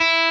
Arp.

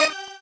normal hit normal

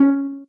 soft hitwhistle; osu

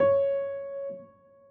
C# o4 Long

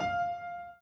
Piano Chord

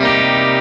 clean Arpeggio down Bm7

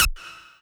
lead

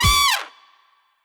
brass hit

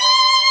violin; osu

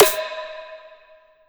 reverbed snare; osu

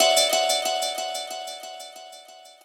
guitar

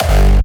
normal hit whistle; osu

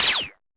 normal hitfinish

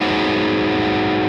Arp.

